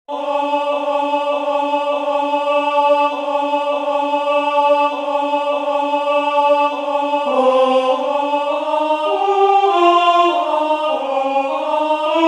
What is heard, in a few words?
Lead